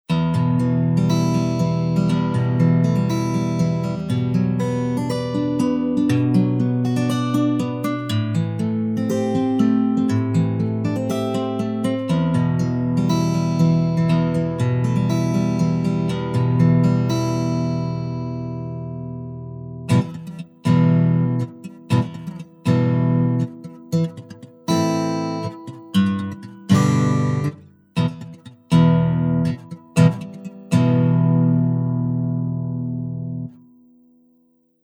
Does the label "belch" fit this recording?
No